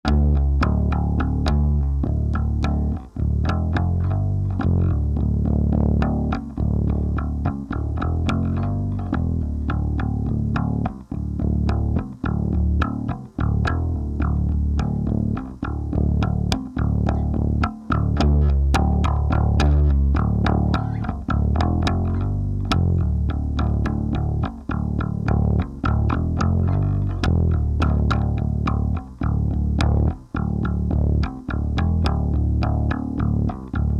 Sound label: basse